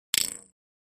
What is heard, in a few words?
Button Elect Spring L